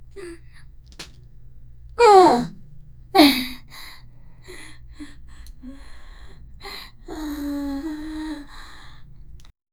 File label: year old girl sights; 14; female